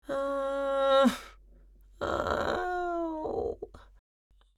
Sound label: Old lady moan in pain